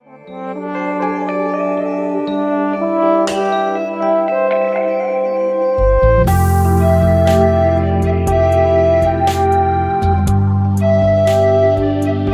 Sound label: Gentle Pop; MIUI14 and HyperOS 1.0